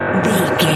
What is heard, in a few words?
retro fail sound